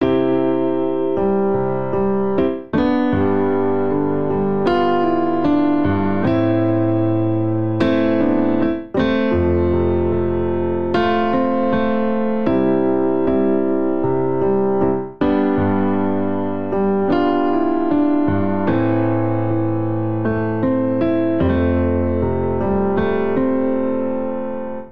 Progression Pop Hammer On I - V - vi - IV C Major 77 bpm, Grand Piano; MIDI Clips; Ableton; Factory Packs